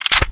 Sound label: m200 clip in, weapons